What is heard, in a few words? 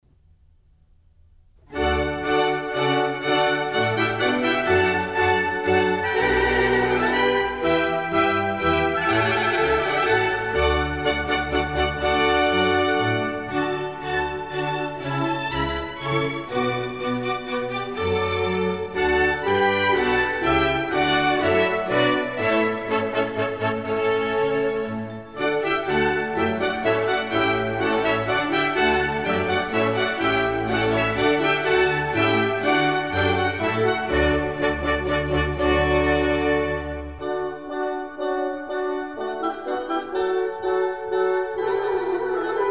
Water Music Suite